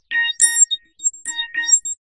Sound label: Vivo Y20s Sms Ringtone